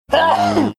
sneezing

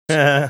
laugh; peter griffin like

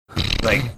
snorting

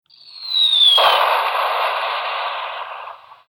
falling bomb

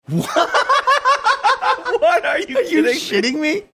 laughing saying what are you shitting me

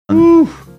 whoo

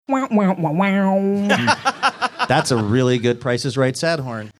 sad horn; price is right

